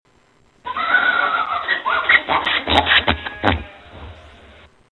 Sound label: Donkey sounds